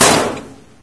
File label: bust metal, debris; mistake coop